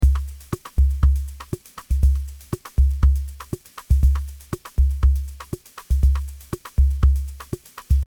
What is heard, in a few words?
Drum rack chord; boss